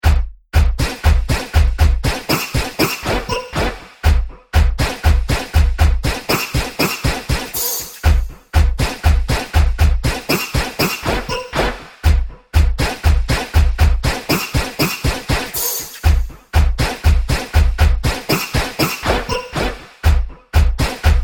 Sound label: Future War Drums Loop; Sci Fi Music